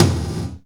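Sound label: drum, Dance Synths Percussion; Mad Kit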